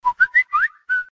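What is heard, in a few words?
whistle zap; samsung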